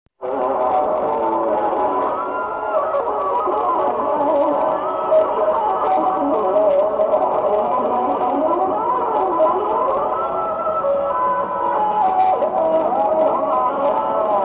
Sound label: panama solo circus